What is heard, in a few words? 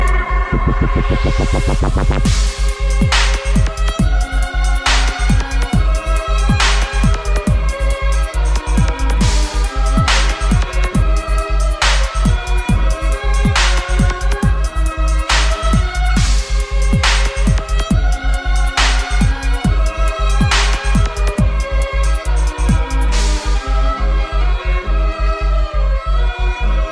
Violin Dub